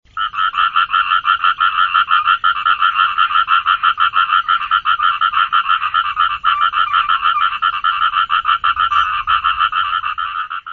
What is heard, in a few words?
numagaeru call, frog